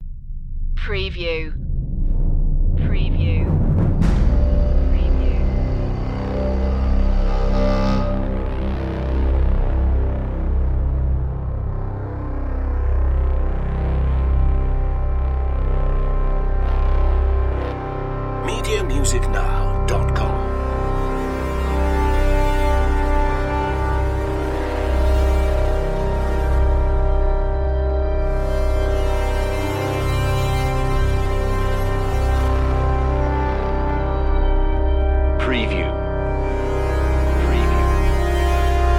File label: dark thriller